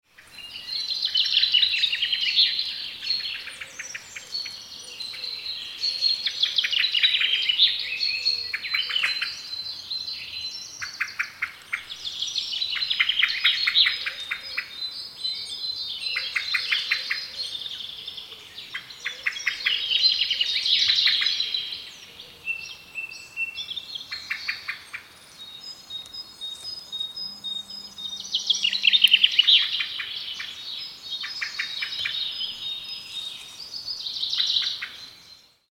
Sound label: spring birds